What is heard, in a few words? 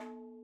madalam notes R; MADALAM 110 7 8; Sample Banks; Percussion; MADHALAM